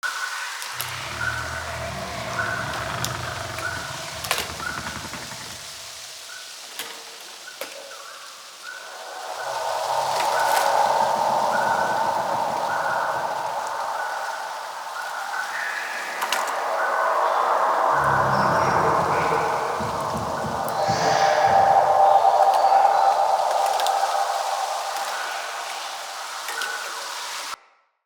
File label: Day Amb Loop, Ambience, Glades; Glades Of Andurin